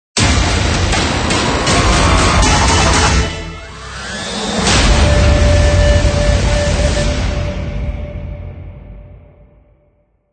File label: Hybrid Trailer Stinger Longer